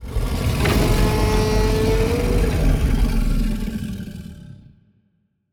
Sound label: kaiju adult superweapon roar; biogenesis